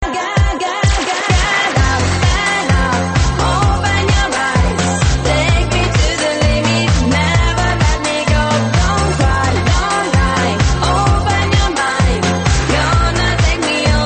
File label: trance, ringtones